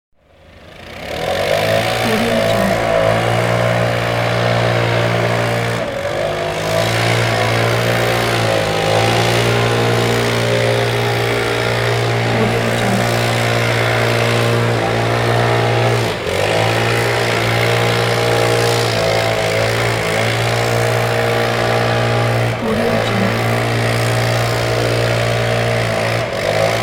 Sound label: leaf blower